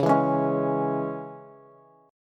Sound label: C sharp Minor, Bass; strummed; chord; 21,19,18,18; JGuitar